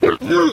Error; Aporkalypse Pigs of Doom

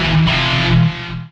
Electric Guitar

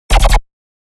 Bass Tech Shot 160 D#

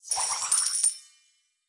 Score Increase; Subway Surfers City; Android, iOS; End Run Screen Score Increase

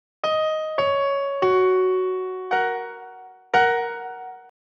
Piano; Smeshariki Cartoon Studio

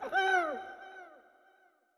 Toxic Mask Hurt

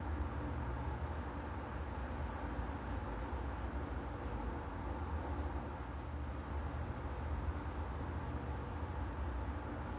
Ambience Menu Loop; subway surfers city sound effects

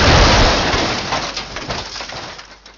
Crash Large; Test Drive Off-Road 3

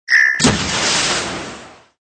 Rocket Launch; SFX Isaac

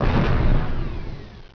Die

